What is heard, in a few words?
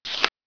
page turn